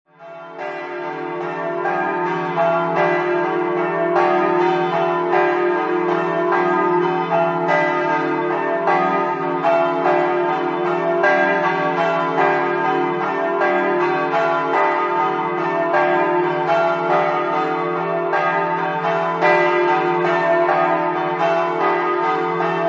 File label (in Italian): verzegnis, campane